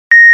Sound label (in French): bip